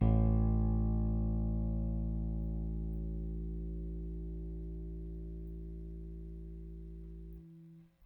Rick Thumb A, Rickenbacker Bass; Airwindows

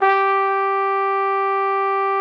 TROP G4, TRUMPET; Xsample VOL-3

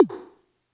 Toms, Elektron MachineDrum

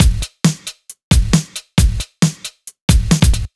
135 Full, Drum Loops; Zero-G; Soundclash Nu Dance Electro; Acidized Wavs; 135bpm; Boom Selector

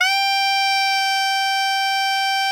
alto FF G 4, saxophones; Greytsounds; Philip Wolfe David Crozier's Saxophones

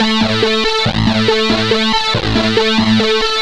Arp 140bpm C, Trance; Arps; Zero-G Trance Inducer; 90 s Sample CDs

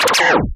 Scratching FX

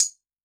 Tambourn, Yamaha DTXpress-II, Perc

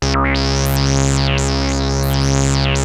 2600 C#3, ARP 2600; Hollywood Edge - Giorgio Moroder Rare Synthesizer Collection

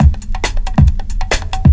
LOOP FX, Big Beat Drumloops; USB Soundscan vol.25; AKAI

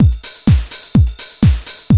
125 Loop

